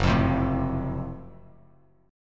F sharp Minor Major 7th Flat 5th F sharp down Bass Standard; strummed